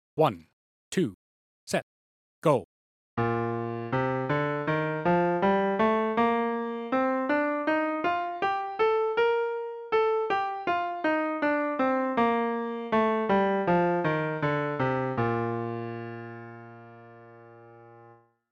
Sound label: F 2 octave 80bpm, two octave scales